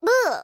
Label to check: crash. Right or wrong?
Right